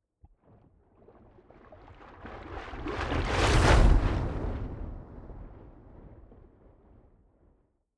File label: waves splash big